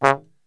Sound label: C#1, brass; Best Service; Brass Super Section; TRMB SHOT